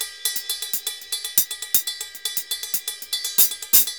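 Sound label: RIDE R, salsa drums and percussion; Best Service ProSamples vol.14; AKAI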